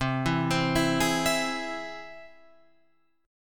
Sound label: C Augmented down Guitar Open F; arpeggiated; JGuitar